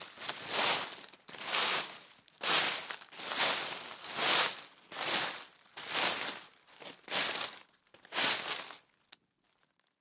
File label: slow shuffling leaves foley footsteps; fvtt sonniss gdc audio